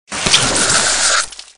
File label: squirt neon water gun miss